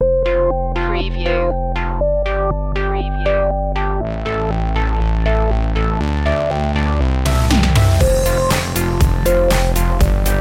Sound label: happy uplifting